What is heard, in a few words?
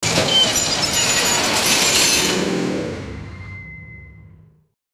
the quick metallic sound, wreck